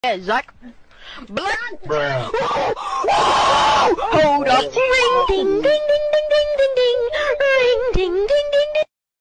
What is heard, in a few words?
ring ding ding; fallguys